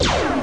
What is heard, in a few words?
player shot; MacAttack 1.9